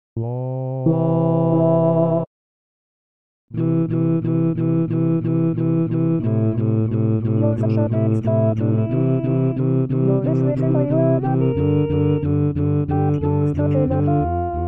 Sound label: Tenor